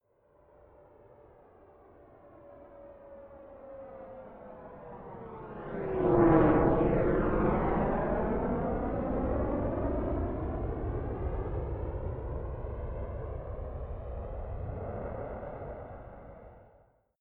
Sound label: military jet fulcrum flyby left to right distant